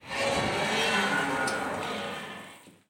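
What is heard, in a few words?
opening of metal door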